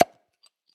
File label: Beer Bottle Pop